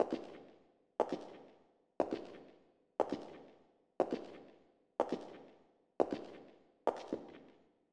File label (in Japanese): aruku; musicbox